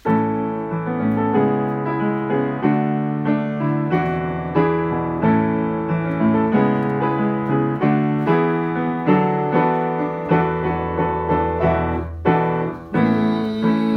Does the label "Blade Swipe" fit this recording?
No